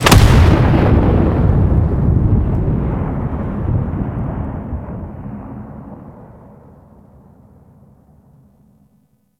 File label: Fortress Cannon Explosion; Ambience; Gameplay; Warframe; Grineer